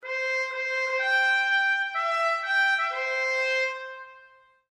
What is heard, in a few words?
cavalry bugle retreat